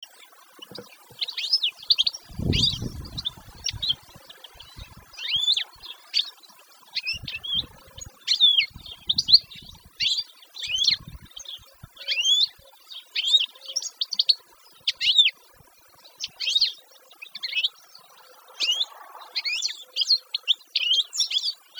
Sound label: Canary Voice